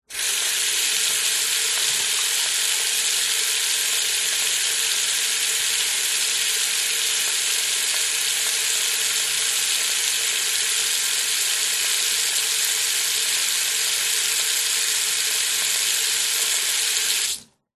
household bathroom basin sink faucet on run off long no plug round sink